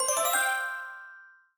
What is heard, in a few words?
game unpaused